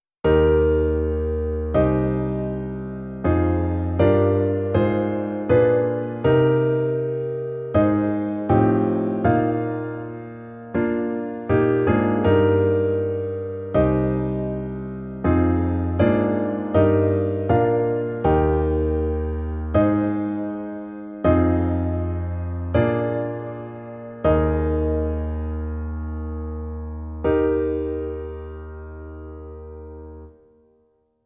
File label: top chord